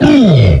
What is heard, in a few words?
zombie hurt